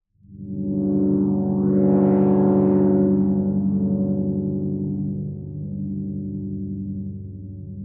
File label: 122 BPM Pads Dmaj Bmin Tonic G, ambient synth pads